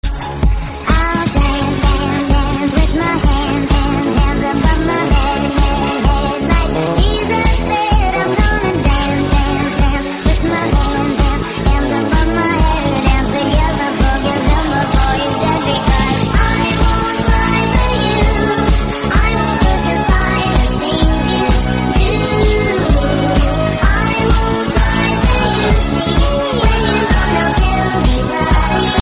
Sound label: English song tone ringtone